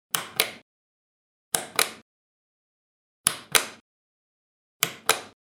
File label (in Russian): zvuk knopki